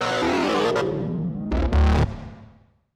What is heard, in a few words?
SPACE FX 140; SpaceSounds